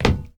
metal footstep; everness

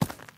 stone dug; everness

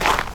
frosted snow hit; everness

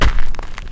gravel dug; everness

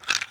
quiver; minetest x bows

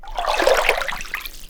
water footstep; everness

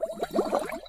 underwater bubbles; everness

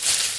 leaves footstep; everness

